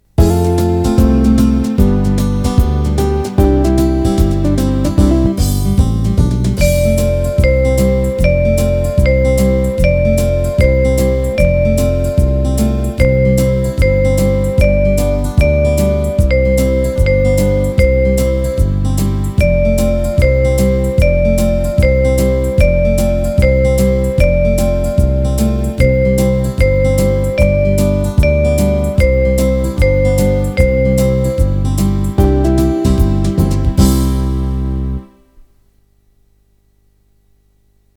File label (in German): Glockenspiel Melodie